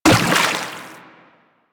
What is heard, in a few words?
Step Water, Footsteps; Warframe; Teshin